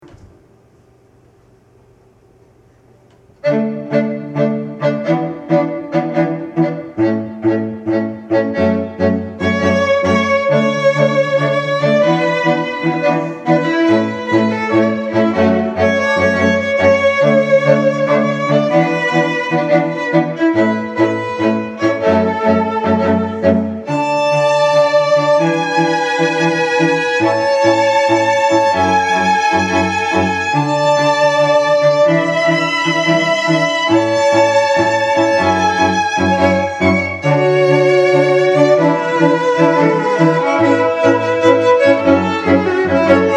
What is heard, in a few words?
string quartet